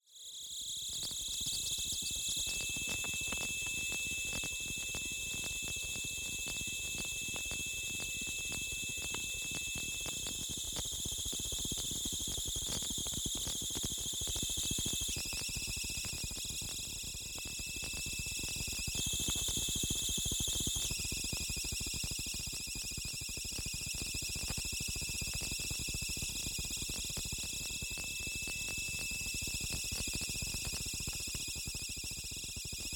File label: WiFi Router Pitchdown 36st; Rescopic Sound; High Voltage